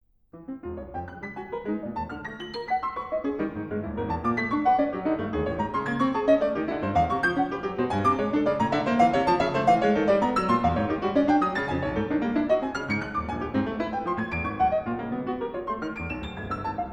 Notations pour piano Rapide

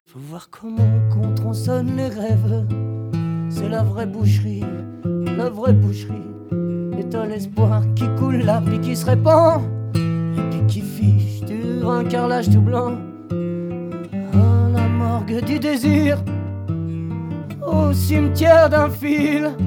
Tango Live

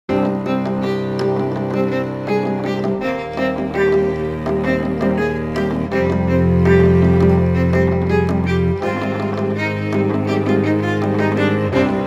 String Quartet

